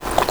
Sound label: squishy miss; wesnoth